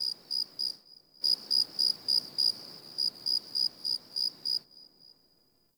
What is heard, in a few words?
Insect Night; Warframe; Eidolon Plains; Ambience